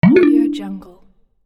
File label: chat message recieved